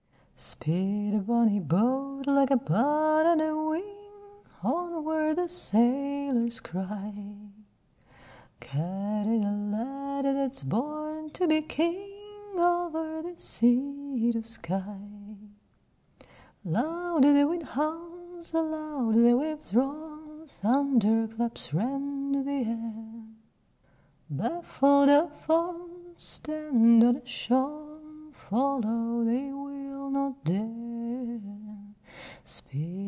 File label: Skye Boat Song, Almost Singing, Wrong Accent